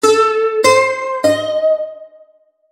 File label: balalaika; AJ Hipkins